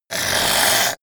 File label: zombie grunt choke male